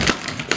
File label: paper push